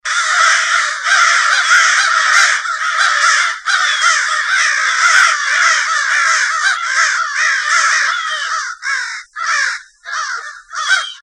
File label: Crows Mobbin, American Crow; Corvids